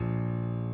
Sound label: key; keySounds